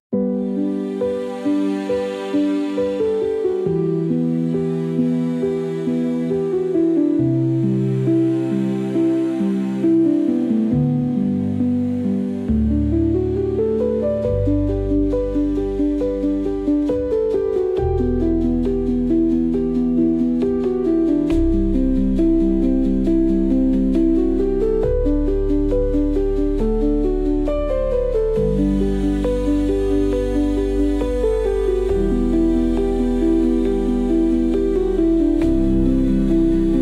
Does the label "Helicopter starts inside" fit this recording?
No